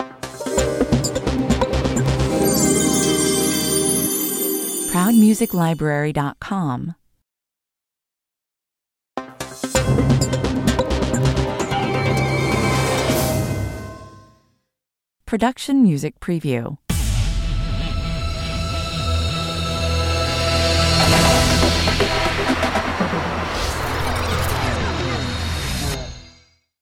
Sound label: Short Intro, full of tension with a surprising break, 130 bpm